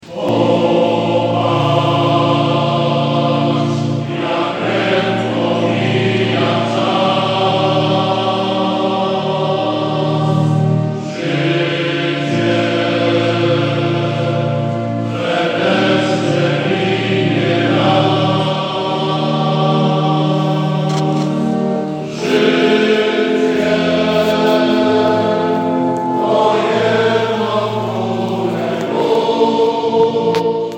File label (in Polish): Chór męski; Popatrz jak prędko mija czas; Siołkowianie